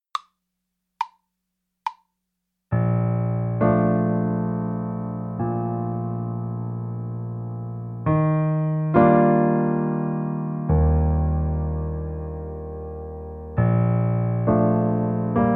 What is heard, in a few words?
Bb major arpeggio accompaniment